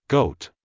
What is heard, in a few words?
goat